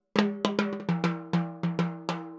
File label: Timbali, Latin Percussion